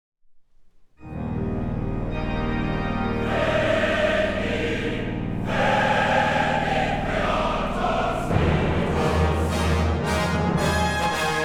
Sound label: Symphony; Mahler Symphony No 8; New York Philharmonic; Lorin Maazel